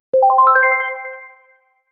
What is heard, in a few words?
light hearted message tone